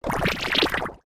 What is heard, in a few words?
magic poison